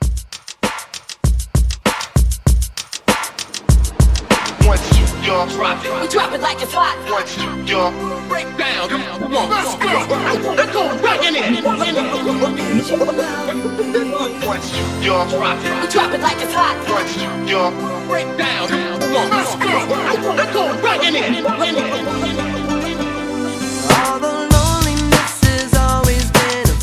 90s Hype Re Drum 98

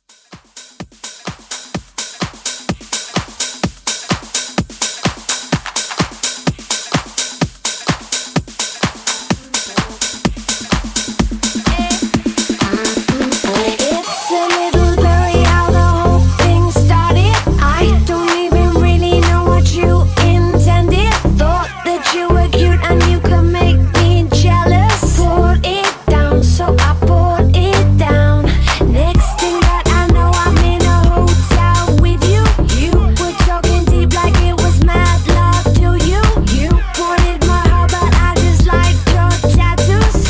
Club Rewind 127 BPM

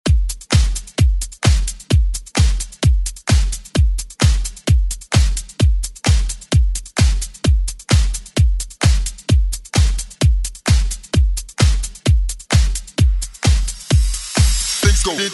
90s ReDrum; DJ Mhark

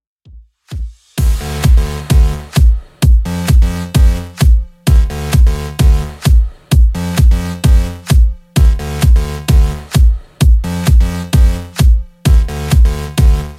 2ks Redrum 130bpm

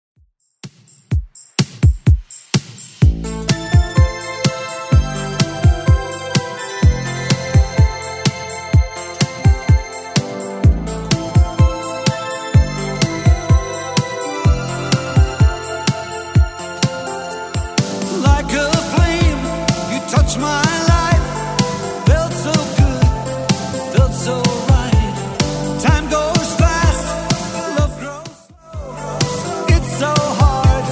80s, 126 bpm

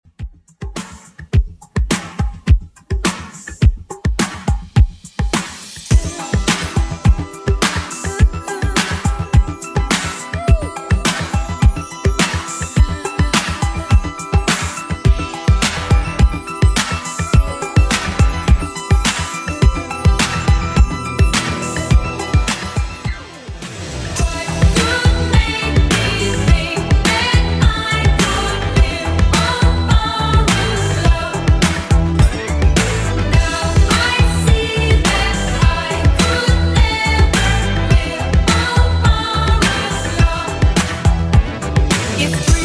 80s Redrum 105bpm

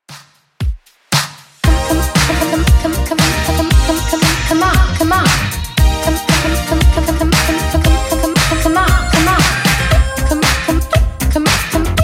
80s Redrum 116 bpm

